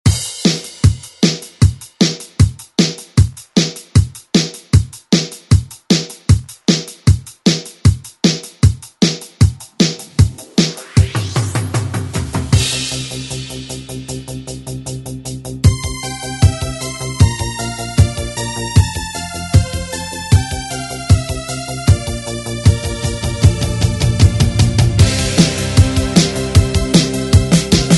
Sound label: 80s ReDrum; DJ Mhark